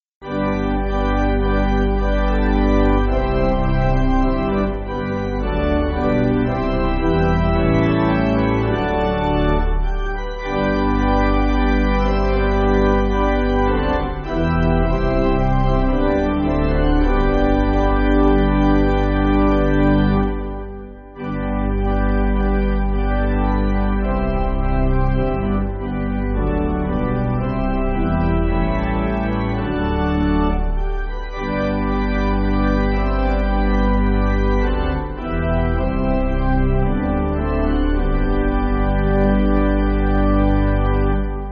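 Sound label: Pipe Organ, Backing Files for Churches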